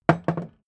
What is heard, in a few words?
hammer wood bounce